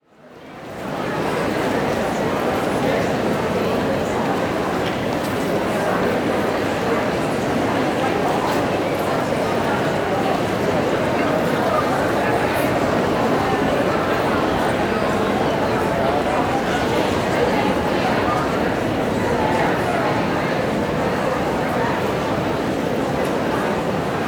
Station Busy Train, Walla; Sound Ideas; Walla Series

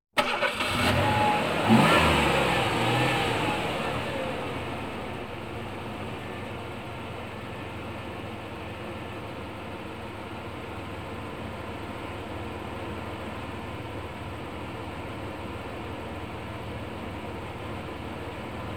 Automobile Starting And Running; KMP Film Video Sound Effects

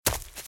Footsteps - Leaves - Run - Right; RadwinIsland

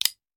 Hand Gun Foley; Sound Ideas; Guns

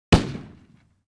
gun machine gun; NCH Library

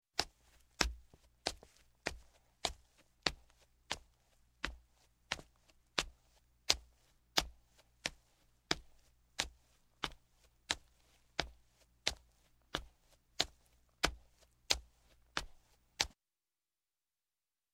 Footsteps, Wood Up Wooden Steps Female, Slow; Sound Ideas 2000 Series

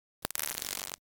Electric Static Sparks; Hollywood Edge; sound designer's toolkit v2

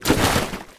Lava Splash; Destruction

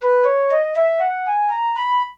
Flute Play Scale Up; SFX Kit; Foley - Misc

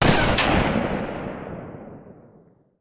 Rifle; Sound Ideas; Guns

